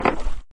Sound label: log jump; CrossyRoad